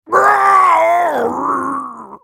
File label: Wolfman dying; Character Voices; English